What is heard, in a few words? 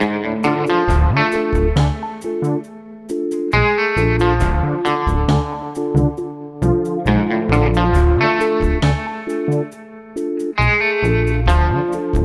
Music Zombie Loop